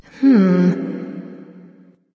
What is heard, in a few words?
thinking light; smite; voicepack; hel